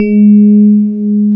PIA DX E-PIANOS

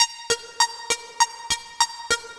Melody, IDM; Sonic Foundry (Sony Creative Software); Twine-IDM

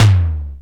80S Lo Tom, Drum Hits, Percussion Hits; Nu Dance Electro; Zero-G; Soundclash; Acidized Wavs

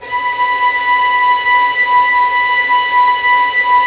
STR VLS, STRINGS; Roland - LA Composer vol. 1

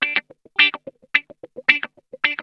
SCRAPE, Funk Guitar; Zero G - Funk Guitar

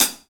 Pop Closed HiHat

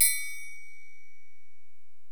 Korg x5, Drums

